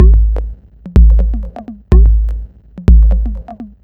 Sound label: Beat 125BPM (Kick Perc), techno; techno drum fx